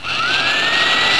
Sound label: SAW